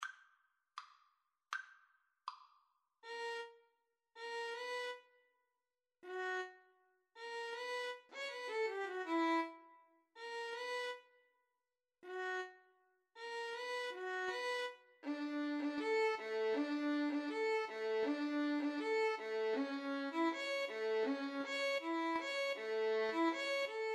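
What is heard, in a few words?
flute violin karaoke; Violin-Flute Duet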